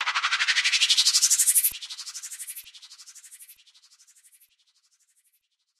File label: fx; drumkits; fx 2 (overused); Freestyle Beats; fl studio; xander brown drumkit